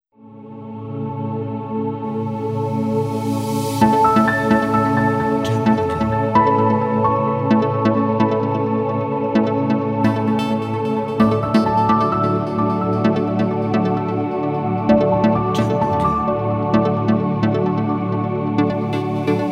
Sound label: Abstract Ambient Intro